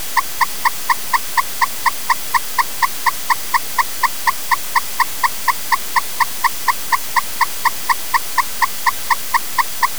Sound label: truck toy receiver